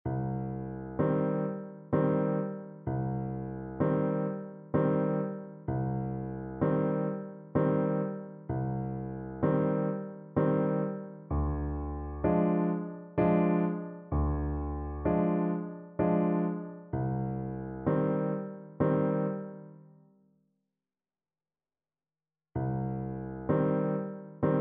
creak crunch scream, Saxophone

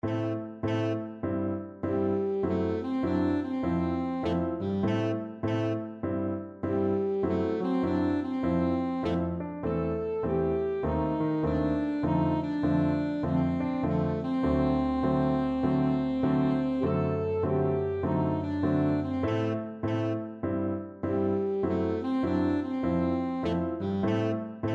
saxophone

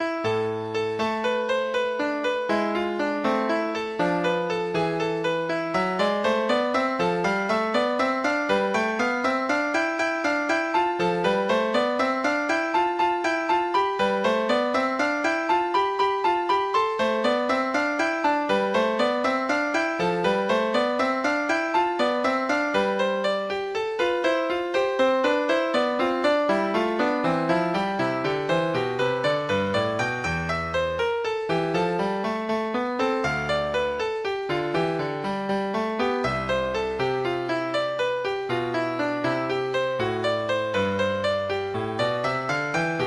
prelude

